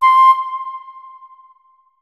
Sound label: flute notes; Hideout Furniture